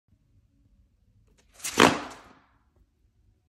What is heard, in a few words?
hand fan